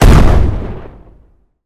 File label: Gun Tank Cannon Turret Shot